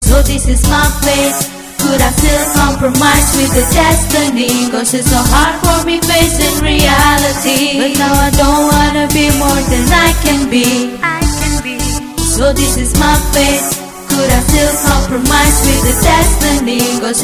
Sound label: Chorus